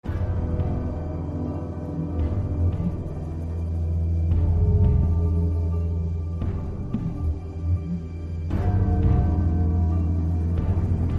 Suspense; tales from the borderlands gamerip 2015

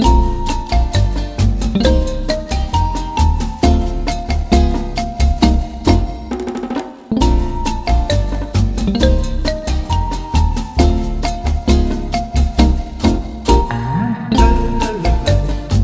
Retro; American McGee Presents Bad Day LA

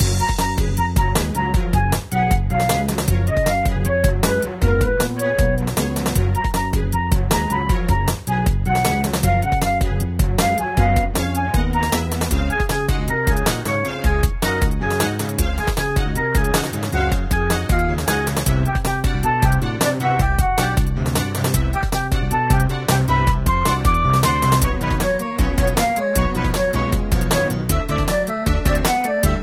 race; Grand Prix Story 2; Android

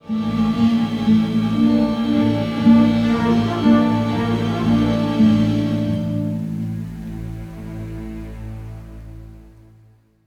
Battles Imperial Lose; Hoth

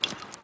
casing; super trench attack 2

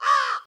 Crow; Lucius III

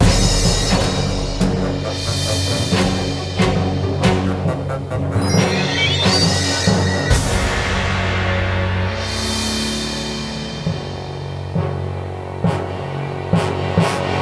Pirate Battle; Lego Battles 2009 NDS

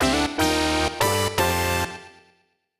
SPACEDISCO STING; Lego DC Super Villains 2018 Nintendo Switch

